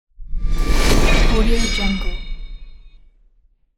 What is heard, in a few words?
magical ability